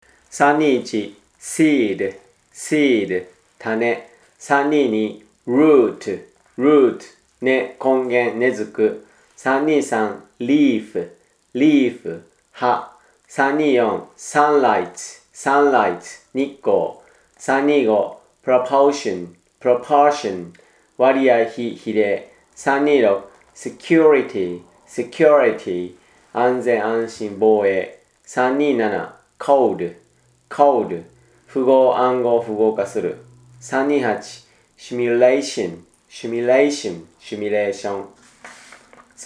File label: tango